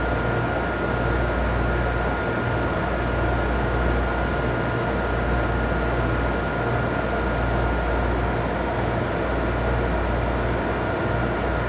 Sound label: ambience large